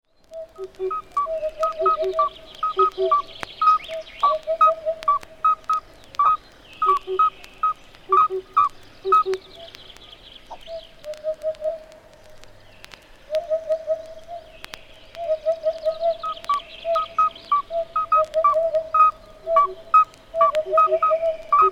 birdsongs

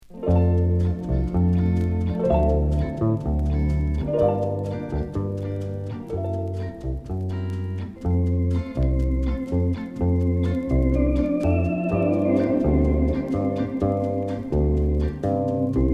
orchestra

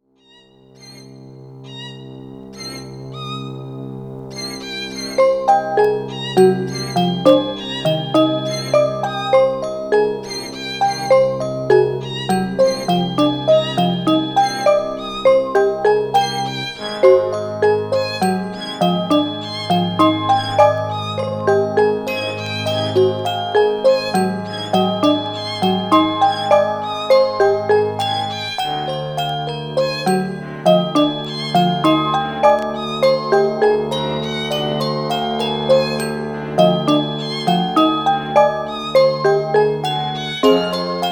mega wave orchestra

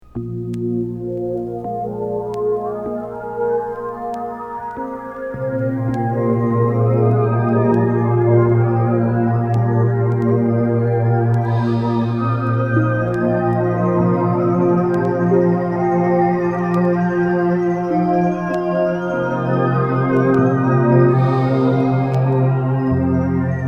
circus underwater

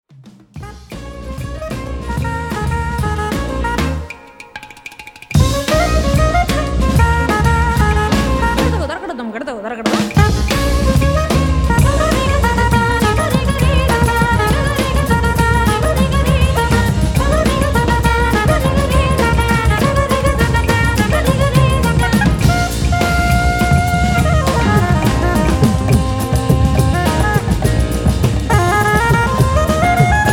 oriental wind

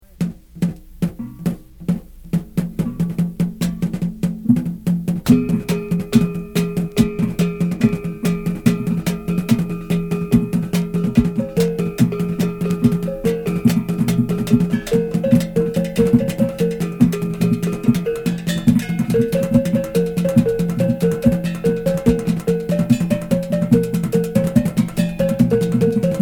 philippine gong

